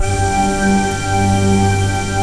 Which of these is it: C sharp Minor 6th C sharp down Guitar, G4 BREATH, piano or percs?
G4 BREATH